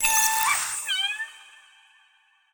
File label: ui load